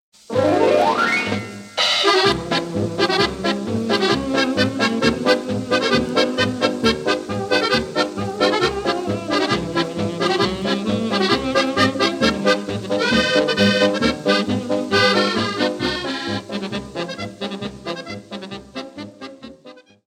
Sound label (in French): Polka - Pologne